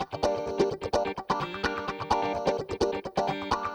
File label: Electric Guitar 128BPM G min; Vengeance Samples; VEH3 Electric Guitar Kit 2